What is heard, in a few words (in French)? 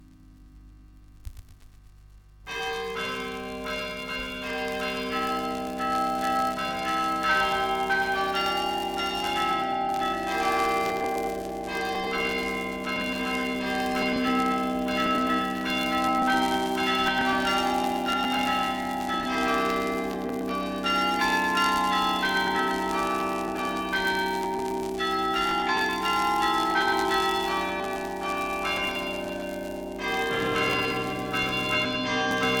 Carillon; Saint-Front